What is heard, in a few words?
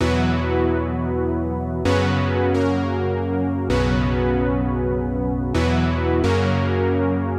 Juno Synthwave Chords G#m 130; Melody; Keyboards